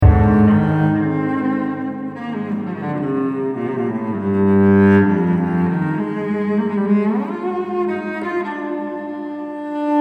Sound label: Cello Postlude; VI. Libera me